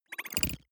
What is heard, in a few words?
data transfer pluck